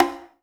PERC, 180 BPM; Ueberschall; Drum & Bass Resonance

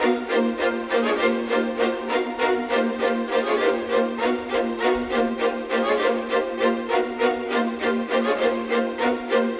Strings; Sonic Foundry (Sony Creative Software); Orchestral VOL-2; Modern 06

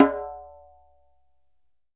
DJEMBE, African Drum

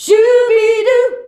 SCHUBIDU B, FEMALES, Hyper Dance

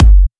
Trance Kicks, Bassdrums

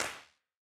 Wider Clap

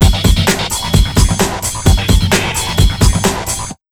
HARD 130, Big Beat Drumloops; USB Soundscan vol.25; AKAI

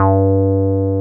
303 SQR G3 F, SQUARE BS

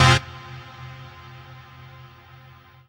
HOUSE, House Dance

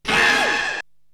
Hit; ZERO G - Datafile DISC 1

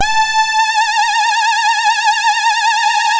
Synth and Organ

